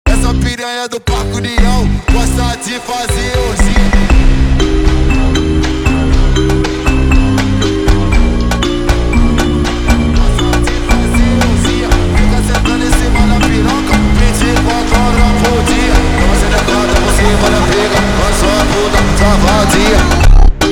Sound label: Funk slowed